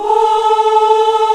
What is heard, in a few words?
VOX, African Aahs; Roland LCDP11 Africa VOL-1